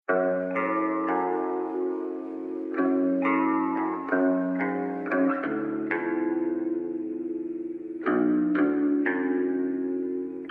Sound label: new remix viral trending ringtone, english